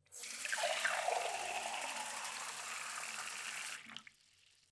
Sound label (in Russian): zvuk nalivanija vody v stakan